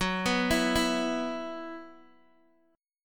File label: F sharp 7th Suspended 4th, Guitar, Open G; x,x,4,4,5,2